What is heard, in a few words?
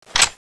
crossbow clip out, weapons; crossbowex21; cstrike